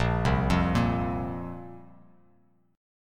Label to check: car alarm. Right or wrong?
Wrong